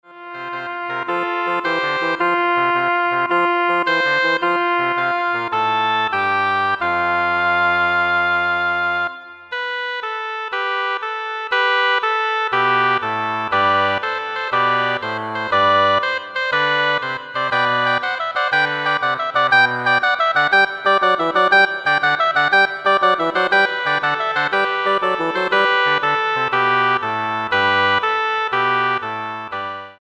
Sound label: Double Reed Ensemble